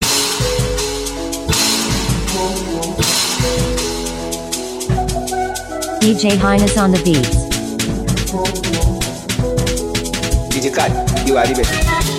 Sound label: Emotional Mara Beat